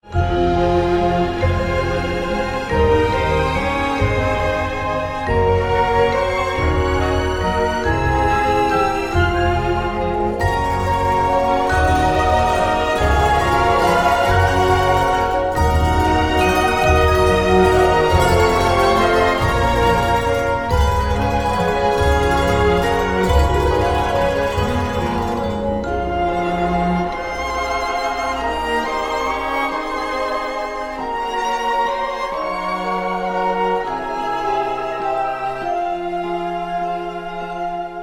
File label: fireside dance; the wizard